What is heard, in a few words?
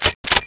def ssg load; enforcer; quakemapping